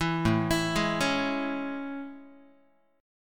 A Major 7th A, Guitar; AM7 arpeggiated; 7,5,7,6,5,x; JGuitar

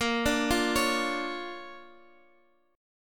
D Minor Major 7th Sharp 5th, Bb, guitar

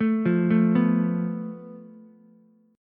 A Suspended 2nd Sharp 5th, Ukulele; Asus2#5; arpeggiated; 2,5,5,2; JGuitar

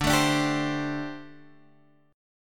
D 7th Suspended 4th, down

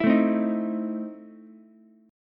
E Major 7th Suspended 4th Sharp 5th Ukulele; EM7sus4#5 strummed

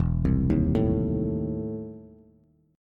E Major 7th Suspended 4th Sharp 5th E down Bass; arpeggiated; JGuitar

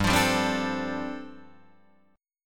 G 9th Suspended 4th, Guitar Open D

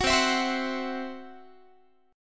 C Major Flat 5th Guitar; strummed; CMb5; 21,20,19,22,22,x; 4A,4E,5A,5D,5G#,6C#

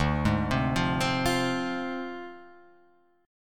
D Minor 7th Flat 5th Guitar Open F; Dm7b5; arpeggiated

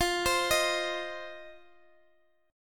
C Minor Double Flat 5th F

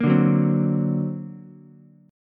D Augmented 7th Ukulele, chord; strummed; 3,2,2,3; JGuitar

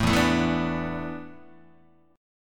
E 7th Suspended 4th, Guitar; E7sus4; strummed

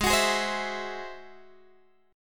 G sharp Augmented 7th G sharp Guitar Open G

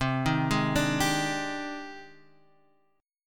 G sharp Major Sharp 11th C, chord

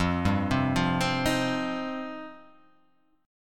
F 7th Suspended 2nd

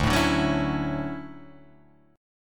D-Major 9th D down

